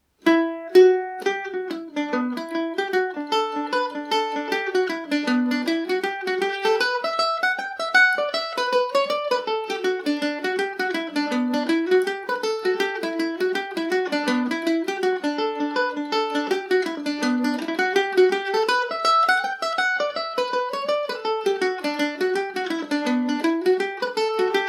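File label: E minor, Irish mandolin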